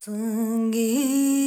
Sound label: ETHNO FEMALE, Vocals; ethno2